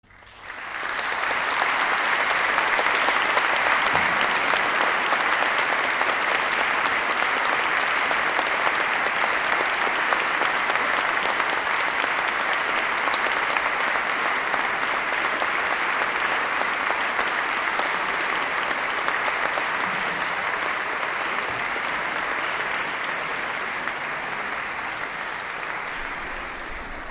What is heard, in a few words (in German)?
APPLAUS; Orchester Holberg Suite Sarabande Edvard Grieg